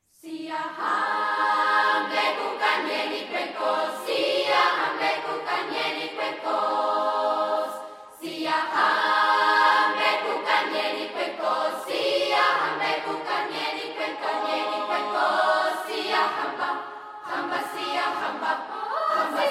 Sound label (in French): choeur; Siyahamba; Tapiola